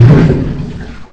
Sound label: underwater; dod; depthch